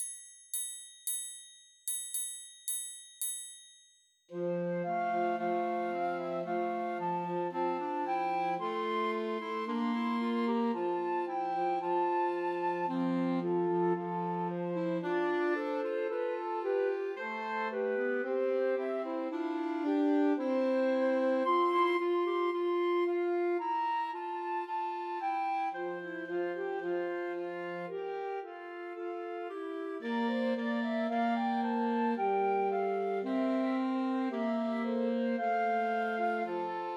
Christmas Trios for Flute Clarinet and Alto Saxophone; Hark The Herald Angels Sing